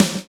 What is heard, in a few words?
snare flat; Roland L-CDX-01; Snares 5; Sn Modules 5